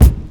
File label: Kick